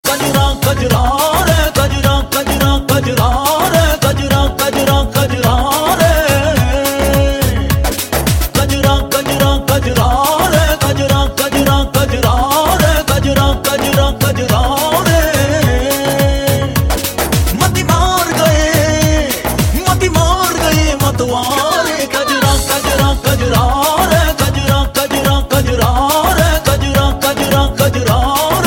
Party Mix

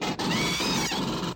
Alien Scream

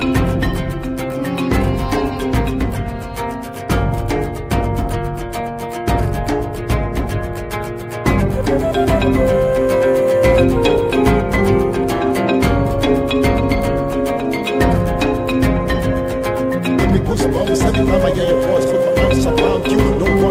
Arabic

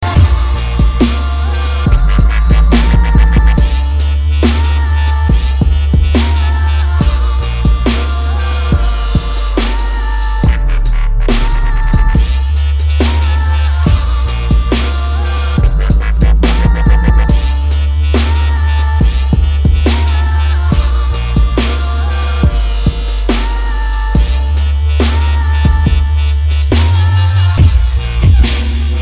Best Dubstep

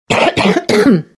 Real Cough

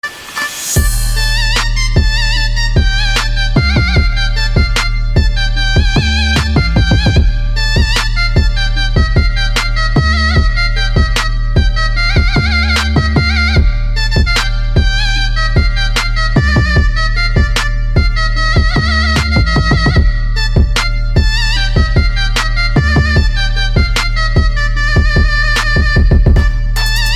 zurna

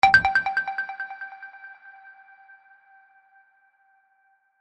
badoop high

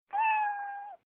Kitten

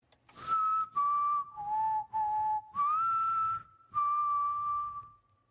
Whistle

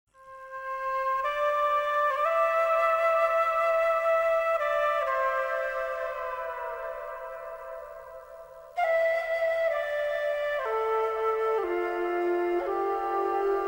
Beautiful Flute

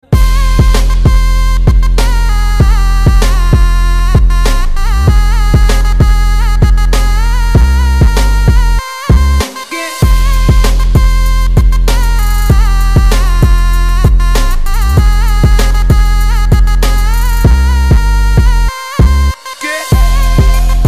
arabic trap

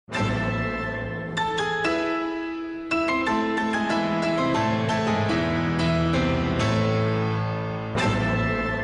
Composer Phone

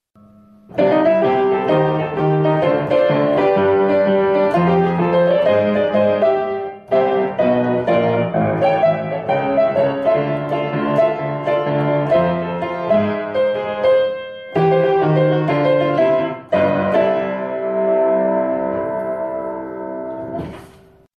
Piano Improvisation

